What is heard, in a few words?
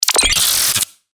BREAKER